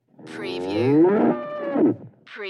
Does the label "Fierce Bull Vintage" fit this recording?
Yes